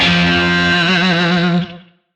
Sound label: Shawzin F; Warframe; Lizzie Shawzin